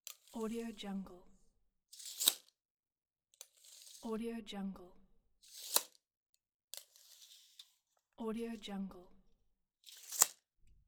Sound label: tape measure; soundred